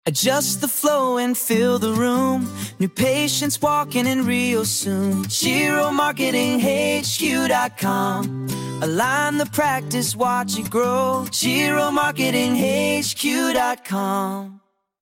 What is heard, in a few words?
jingle, podcast